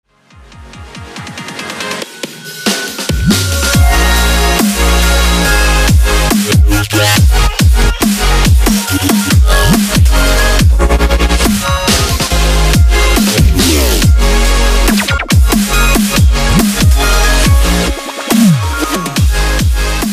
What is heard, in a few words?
melodubstep